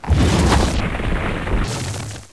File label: Hell Fire